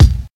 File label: rich mids kick single shot a sharp key